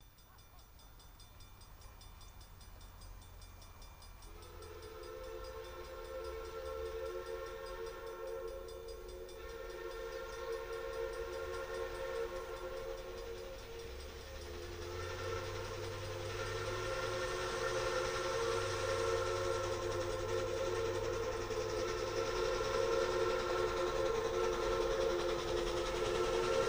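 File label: Steam Train By Fast Horn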